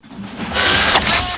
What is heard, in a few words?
transformation; aura